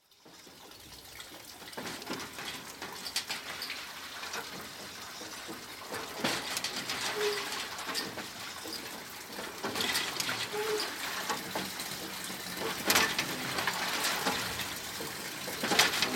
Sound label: Greece: Windmill, creaks of windmill & water pumped into tank; Outdoor Sound Effects; Countryside; BBC